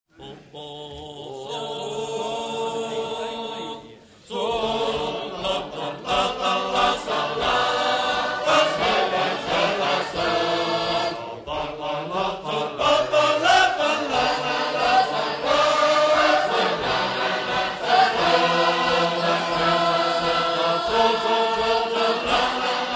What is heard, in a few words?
Sacred Harp singers